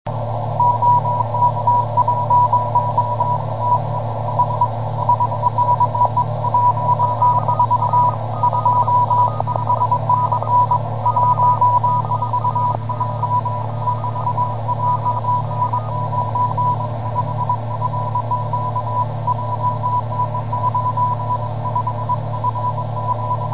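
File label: CW, 144 MHz, amateur radio; 9A2VR JN85FQ 579 CW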